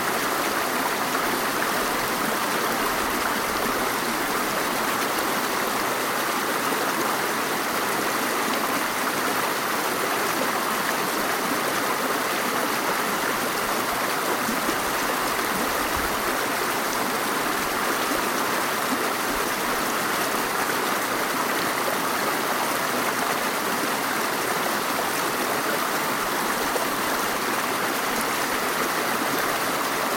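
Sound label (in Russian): рокот бушующего водопада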